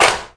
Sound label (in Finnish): tolkki, Clangy Crunchy Foundsound Kit